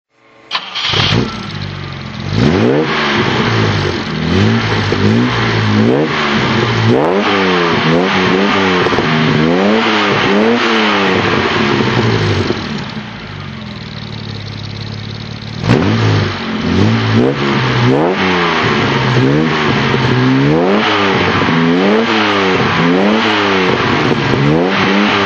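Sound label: jaguar etype 42 hardtop throttle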